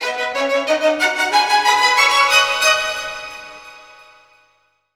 SF STRGS 2, Roots Funk; USB Soundscan vol.41; AKAI

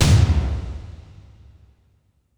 Percussive Stab

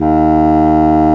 WND CONBSO, ORCHESTRA; Roland - LA Composer vol. 1

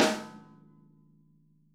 SNARE, Interactive Drum Kits

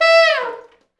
T Sax falls, Horns Sax

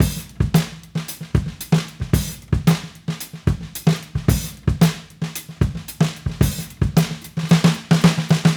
Raw Funk Drums Fill; Sonic Foundry (Sony Creative Software); What it Is! 70s Analog Funk

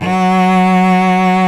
SOLO CELLO

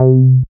SYN TB ORIG, Roland TB-303; TB303+MC202; Sound & Vision - Gigapack I CD 2

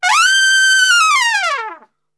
G 4, Brass; Best Service; Brass Super Section; TRP LNGFLL

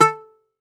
Greek Bazouki, Plucked Strings

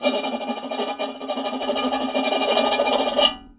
Raw, Tin Of Guitar Strings, contact mic

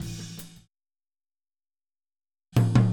Boogaloo Fill Tom Rack, 82 BPM, Drums

